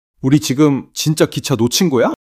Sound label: surprise